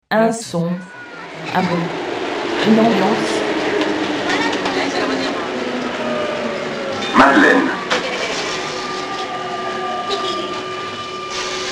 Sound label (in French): Un son un bruit une ambiance